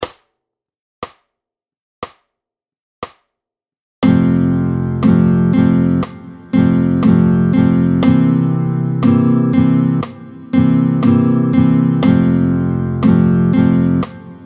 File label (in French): rythmique tempo 60